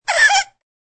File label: Kid Vocal Squeak, Toddler nonsense sound; Exclamations; Voice